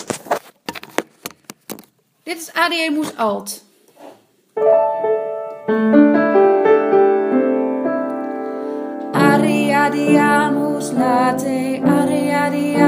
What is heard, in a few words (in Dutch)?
Alten